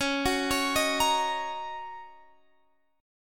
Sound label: Gb 6th Db